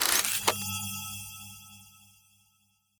solve lock

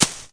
Ball Sounds; Pangya; Fantasy Golf

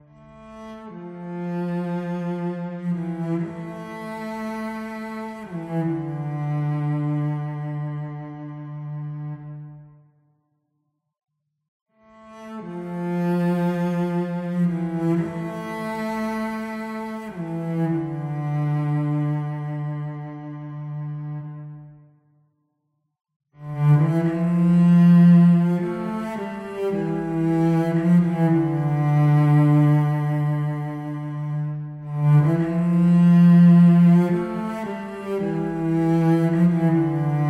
Cello Layer

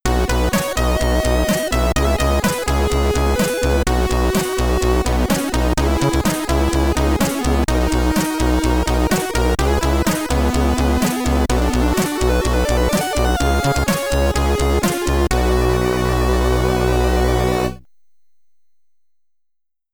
Congratulations (SID Stereo), commodore 64

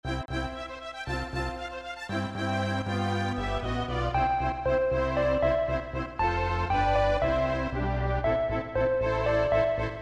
Harp; Heracles no Eikou IV Kamigami kara no Okurimono

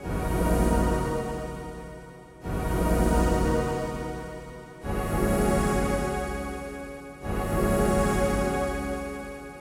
Synth Talk Layer

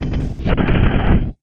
Big Gate Open

